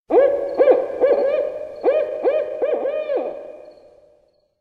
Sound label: barred owl